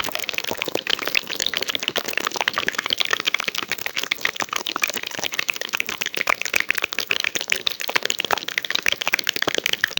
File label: plap plap plap schlorp